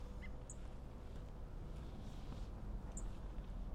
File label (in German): maskenammer